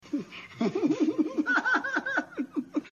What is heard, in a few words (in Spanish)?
risa; don ramon